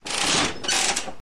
spin, Slot Machines; RS Games Sounds

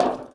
bleacher step; Golden Crayon Sounds

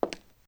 stone step; Golden Crayon Sounds

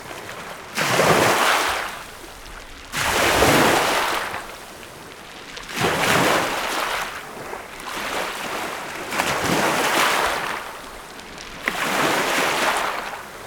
beach; Golden Crayon Sounds

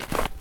snow land; Golden Crayon Sounds

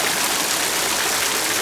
water fountain; Golden Crayon Sounds

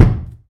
bathtub step; Golden Crayon Sounds

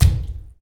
bathtub step; Golden Crayon Sounds

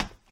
tile step; Original TK Sounds

